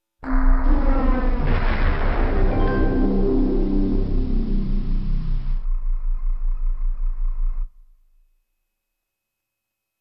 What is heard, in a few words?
Soundscape (FM); Soundscape Elite; Dark Forces